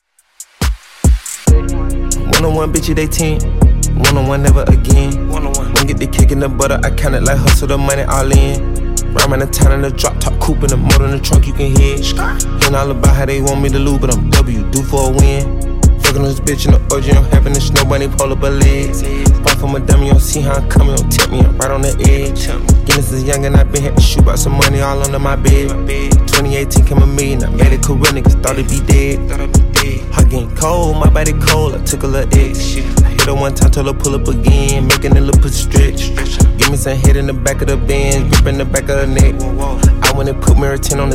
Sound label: 70Bpm